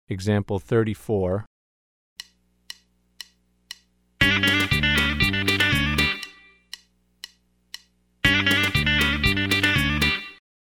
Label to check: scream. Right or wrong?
Wrong